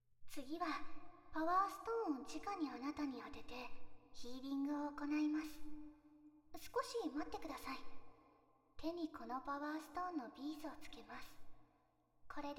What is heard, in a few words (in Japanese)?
ビーズ（パワーストーン）でマッサージ, ASMR